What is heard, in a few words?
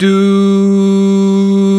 VOX, Male Scats